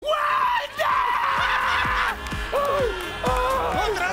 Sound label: wandaaaaaaaaaah